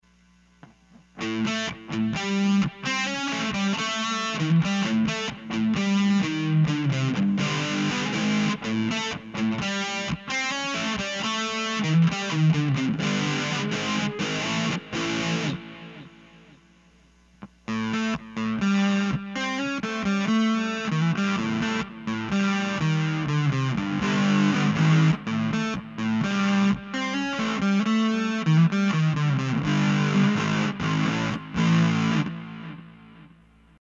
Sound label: crunchy Vs Synthy Fuzz